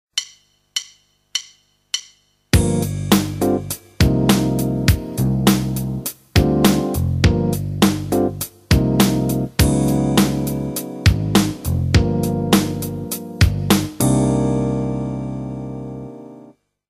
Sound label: Chord Camp backing, Guitar; Guitar Techniques 2017 Issue 266 March